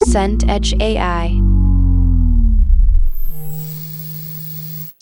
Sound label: subdrop tapestop